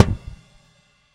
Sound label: Long Crash; Airwindows